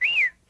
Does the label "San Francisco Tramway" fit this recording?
No